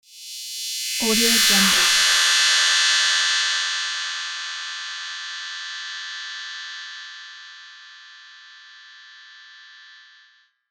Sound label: neo laser transition